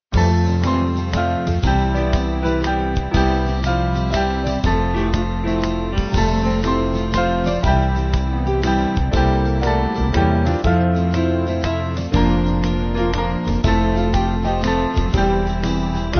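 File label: Band, Music Backing Files for Churches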